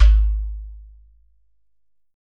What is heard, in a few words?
GHATAM